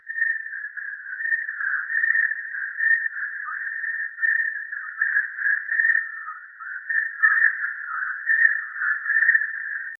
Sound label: prehistoric swamp